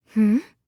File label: hmm